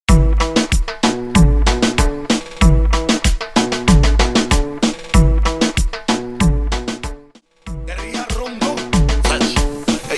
Remix 95 BPM

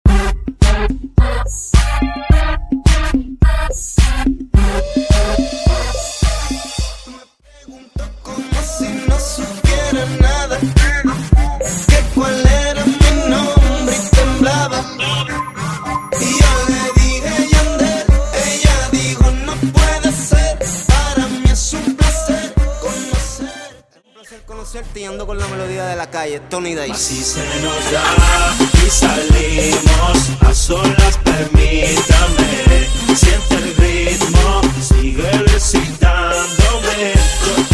Intro Outro 106 BPM

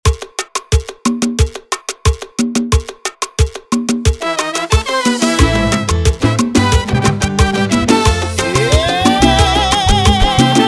90bpm, Intro Outro

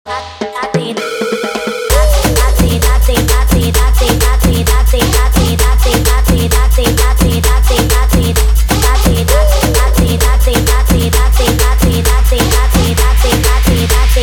mashup 130 bpm

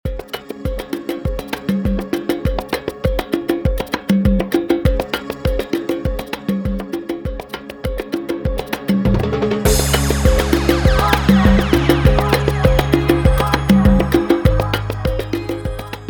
Transition Salsa To Bachata 100-134 BPM

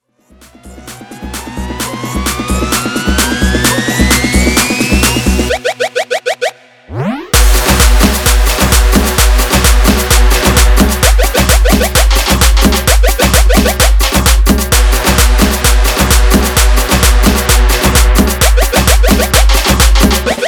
guaracha remix 130 bpm

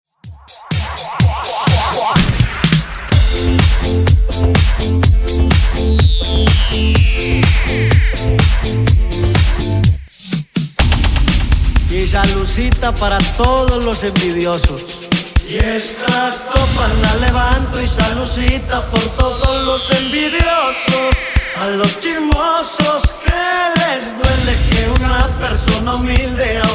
Remix Intro Outro 125 Bpm

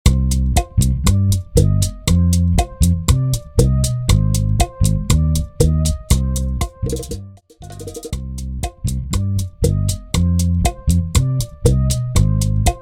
Bachata Project 119 BPM; bootleg bachata remix

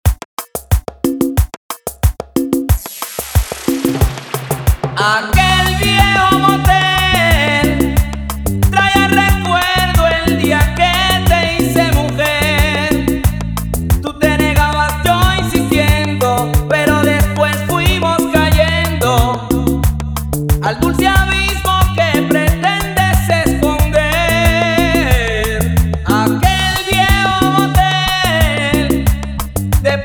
acapella 91 bpm

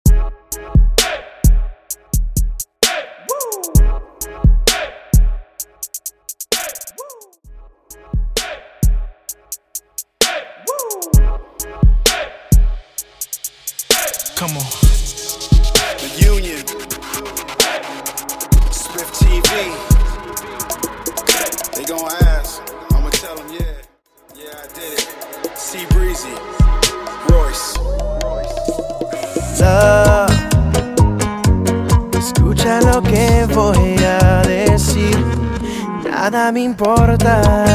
Trans Trap To Bachata 130BPM; transition

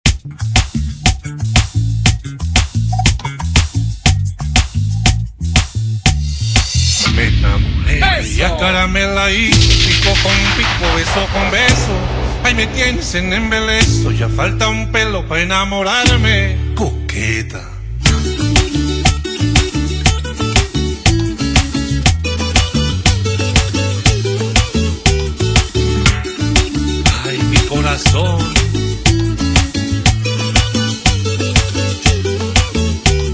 Intro Outro 120 BPM

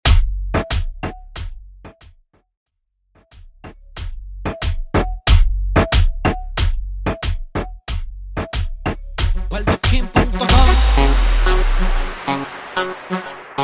Intro Break 90 Bpm; Waldoking; Dj Cristh

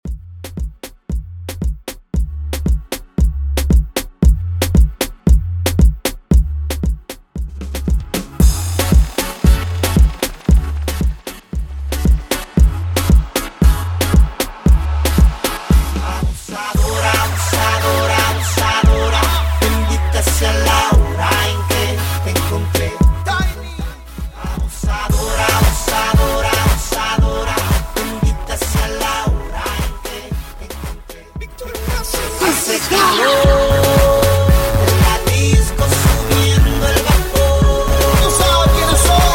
Intro Break Outro 115BPM

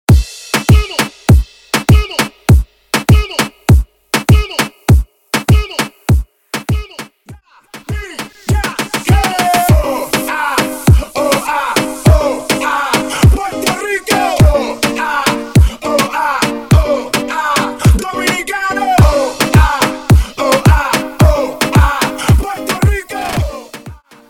Transition Reggaeton To House 100 To 130 BPM

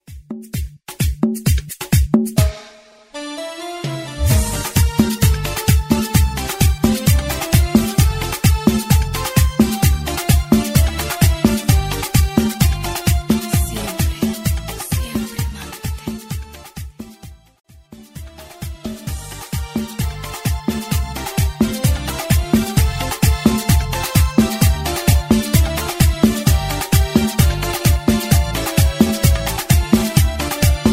130 BPM Extended

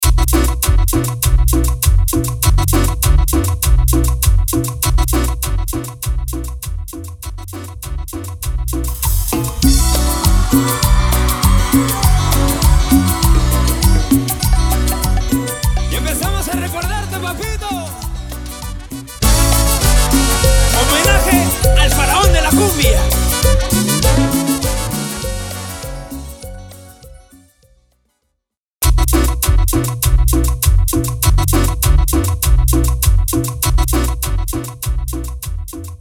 meddley rework 100 BPM

